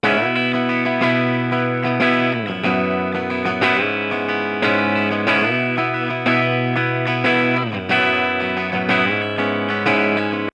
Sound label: rhythm gtr 2 stereo clone theory